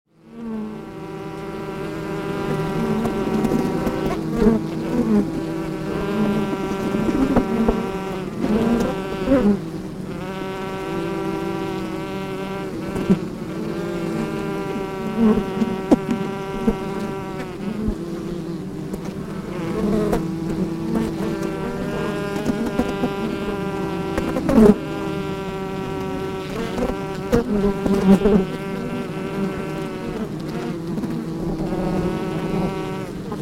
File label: honeybee vibrations in harpenden